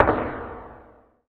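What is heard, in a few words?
PER PROCU, Procussion, percussion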